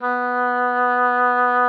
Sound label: OBOE B3; Xsample VOL-6